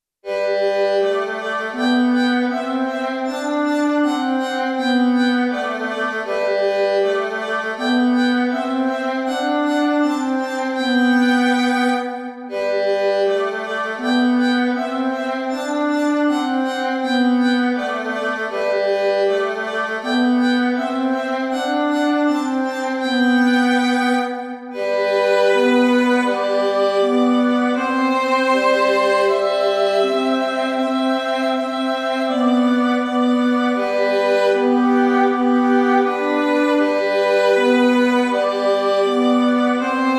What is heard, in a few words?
Violin Fun